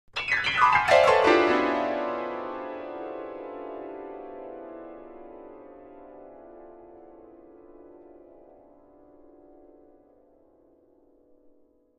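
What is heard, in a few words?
Grand Piano Descending Chords; keyboards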